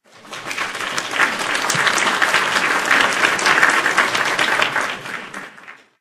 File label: applause